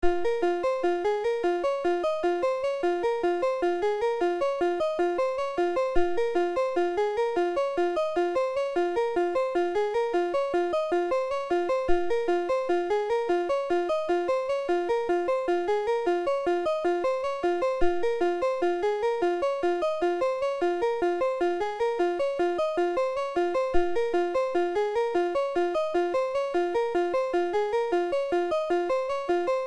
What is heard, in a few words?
tubular tone dry